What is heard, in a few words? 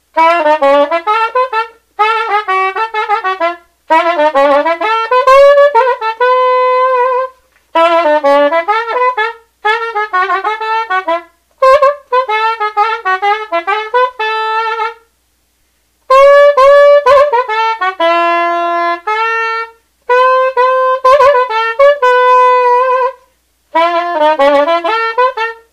Conn Beauford Sop B Flat Straight B flat